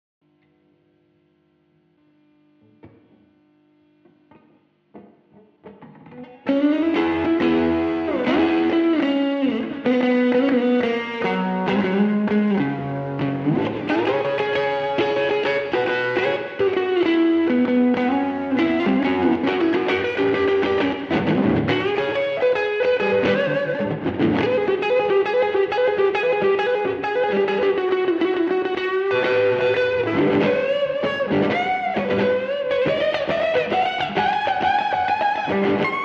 Guitar

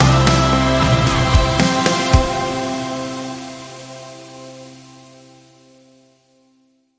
Bumper

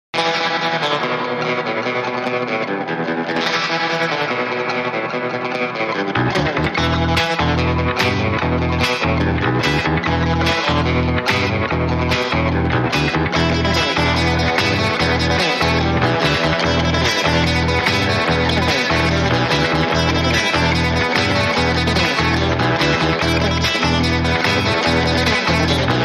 Zen, No Drum

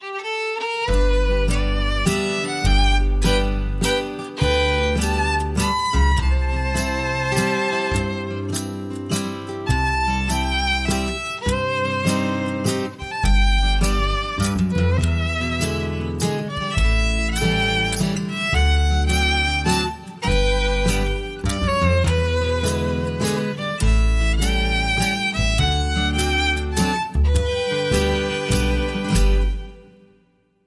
Petticoat Waltz

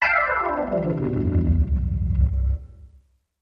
Organ Gliss Down

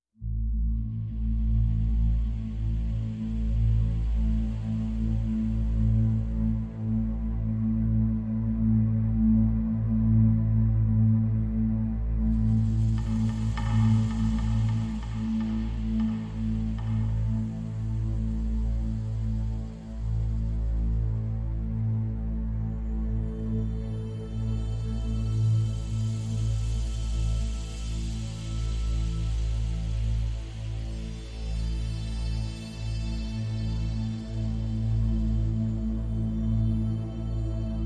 A minor, No Guitar

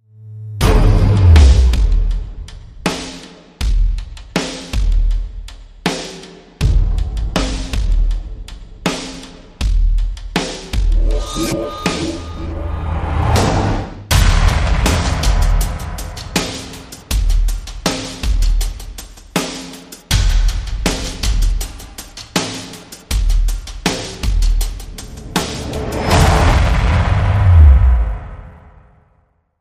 Drums and SFX

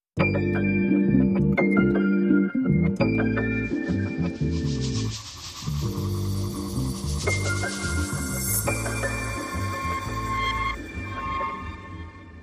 Long Sting

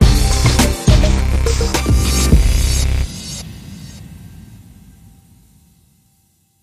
G Strings, Sting

